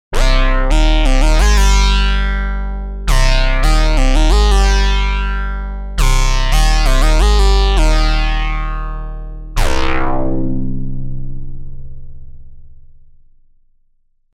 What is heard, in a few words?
Mini Brute Ripping Lead Bass